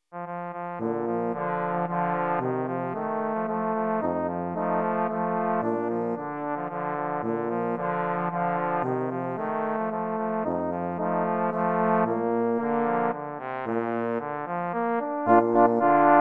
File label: German Air